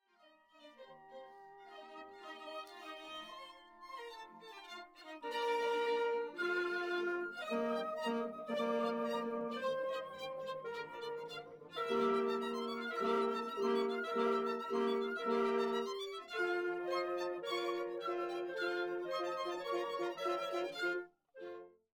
anechoic Orchestra 0deg

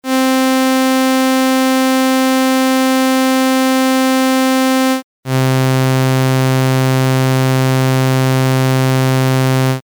Stock Saw

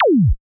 impulse response

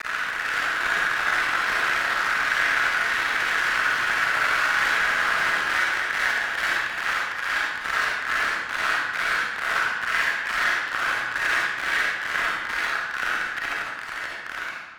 60 clappers syncronized

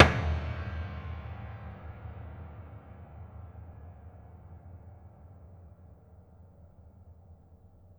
knock; pedal

